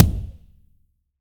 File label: Kick